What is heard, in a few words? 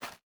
Pack Take; MiSide